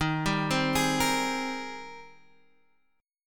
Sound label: Ab Major 9th Eb